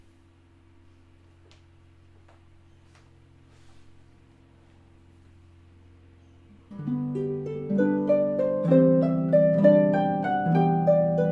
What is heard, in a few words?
Harpist; Houston